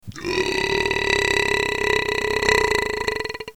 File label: brap